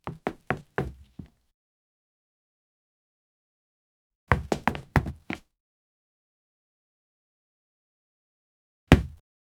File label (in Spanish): cayendo de pie sobre un, concrete